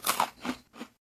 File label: Consume Food; Going Under